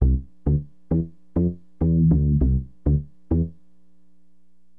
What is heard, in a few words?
SYN BAS, Acid Jazz Funk; Bass